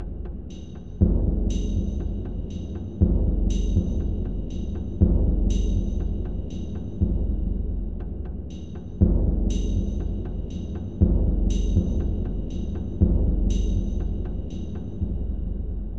battle dance, Simple drum loop with pre-battle vibes.